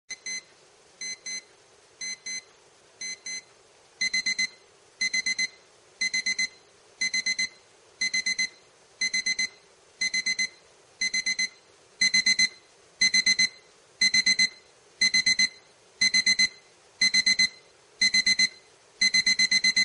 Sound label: Alarm Clock App Sound